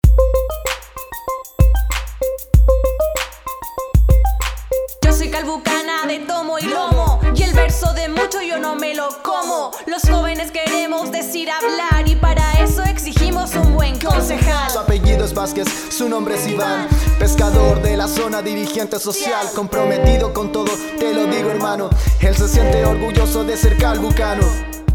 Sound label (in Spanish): jingle hip hop